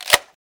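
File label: bolt back, rifle; m16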